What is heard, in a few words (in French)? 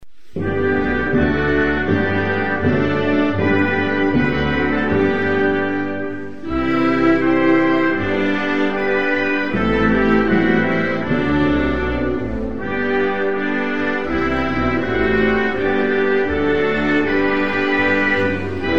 Nouvelle Zelande, Hymnes nationaux